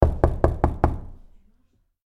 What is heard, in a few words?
Heavy Door Knock